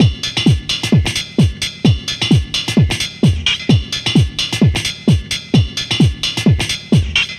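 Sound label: House Beat with Ride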